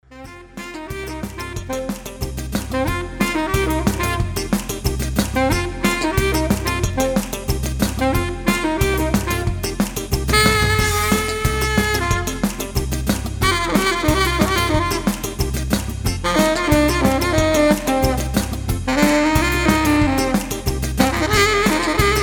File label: Sax inst